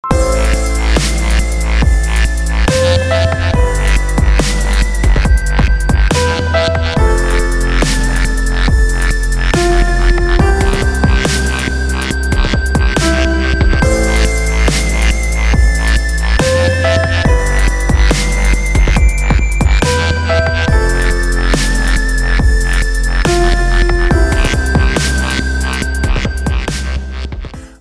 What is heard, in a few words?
dubstep mix